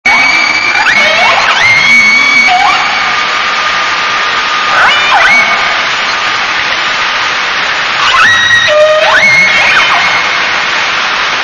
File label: Whale; animali acquatici